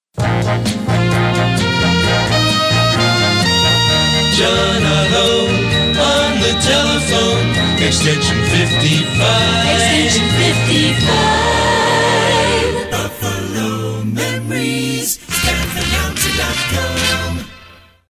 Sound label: jingle